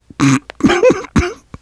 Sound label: laughter, survivor voice